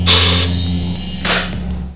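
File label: elevator go; Nukem3d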